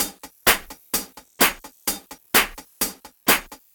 House Loop, 128 BPM; Vengeance Samples

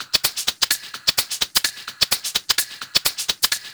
Shaker Loop, 128 BPM; Vengeance Samples

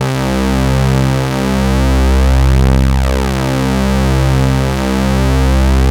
Ultimate Bass Root C; Vengeance Samples

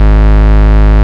Root G#, Bass; Vengeance Samples

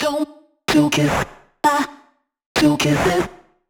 House Loops, Vocal Loops House 128BPM

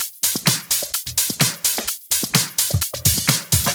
House loops 128BPM; Vengeance Samples

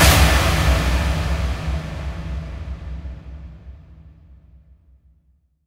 Hits & Explode

